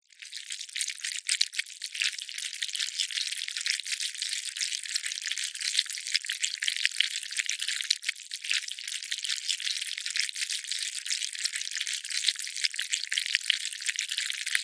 nature bacteria organisms movement wriggle close squelch; zapsplat